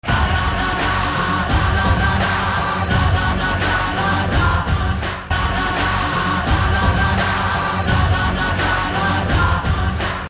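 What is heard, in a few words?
Trailer background Ringtone; Tanhaji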